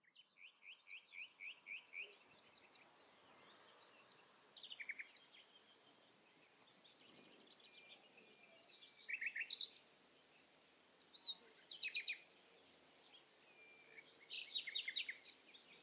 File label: Birds